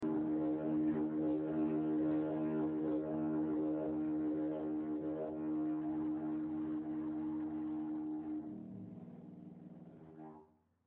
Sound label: Didgeridoo In A Cave